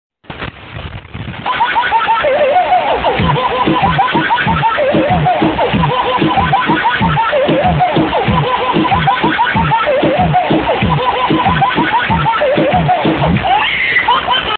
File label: baby laugh song low quality